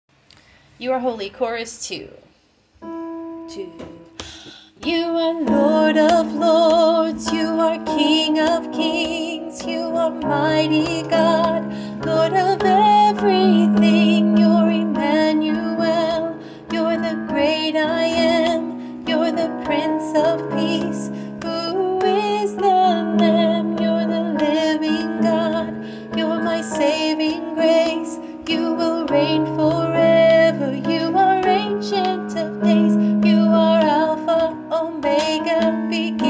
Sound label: chorus